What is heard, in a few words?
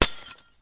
glass shatter